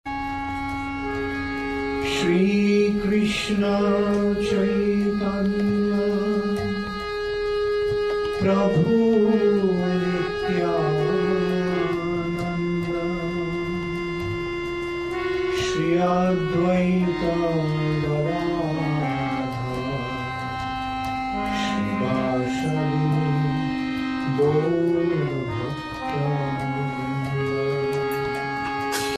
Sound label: Panca-tattva Maha-mantra Sung